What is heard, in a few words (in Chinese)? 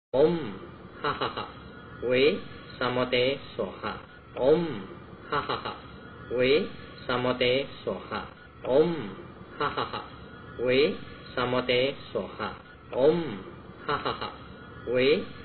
地藏王菩薩心咒 Ksitigarbha Bodhisattva Mantra